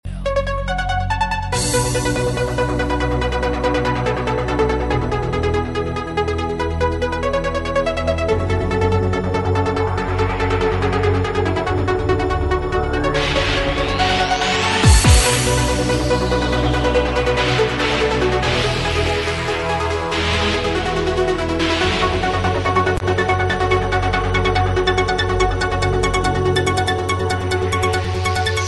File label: Gladiator Trance